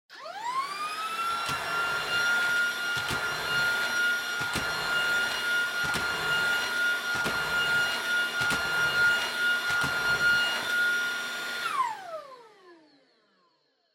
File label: Vacuum Cleaner Vacuum Floor High